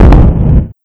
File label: explosion large; endless sky